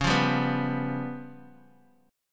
Db 7th Flat 5th Db, down, Guitar Open D

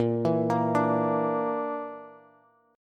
A sharp Major Flat 5th, Bass; arpeggiated

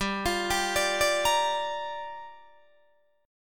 G Minor 7th, Guitar, DADGAD

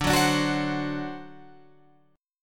D 7th, down, Guitar, Open F; strummed

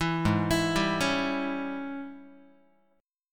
G sharp Major 7th Suspended 4th Sharp 5th, Guitar; arpeggiated; chord; 7,4,7,5,5,x

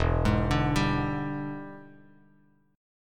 E 7th Sharp 9th E down Bass Standard

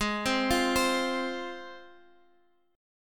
G sharp 6th, Guitar, Standard; arpeggiated; capo 2; x,x,6,5,6,8

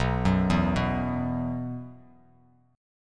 B Suspended 4th, Bass Standard; Bsus4 arpeggiated; JGuitar

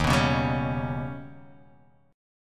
D sharp Augmented 7th D sharp

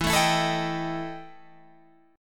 E Minor Major 7th Double Flat 5th E Guitar Open G

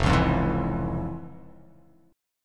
F Minor Major 7th Sharp 5th

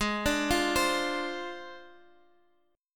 D-Minor 7th Flat 5th, Ab

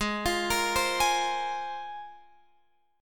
Ab 6th Add 9th Ab, Guitar, Open F; x,15,17,17,15,15; arpeggiated

